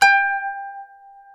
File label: KPES G5, KANUN; SoundBytes; Early Patches; KANUN SMAL